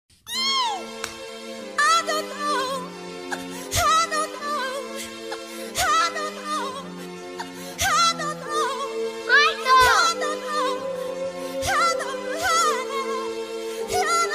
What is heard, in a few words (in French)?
Sonnerie